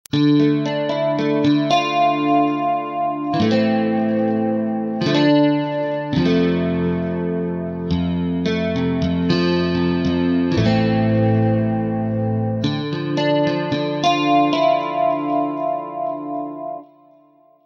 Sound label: STR clean